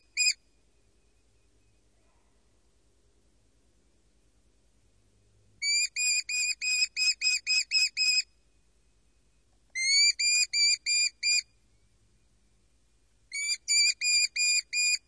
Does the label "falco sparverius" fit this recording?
Yes